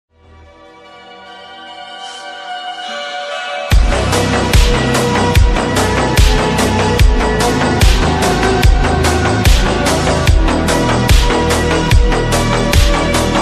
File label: slowed reverb ringtone